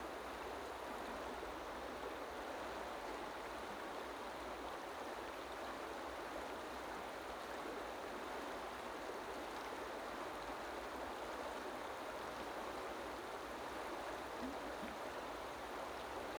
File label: River Edge Water Lapping Constant; Rosja; UltimateFishing2020